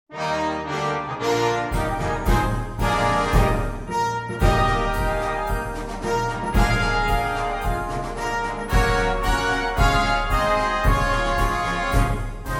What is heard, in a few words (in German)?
Marsch